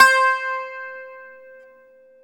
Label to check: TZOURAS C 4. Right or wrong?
Right